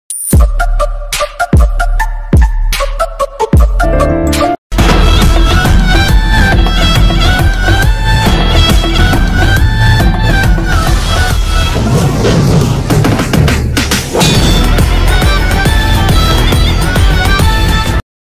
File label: mass bgm telugu bgm ringtone; ooru peru bhairavakona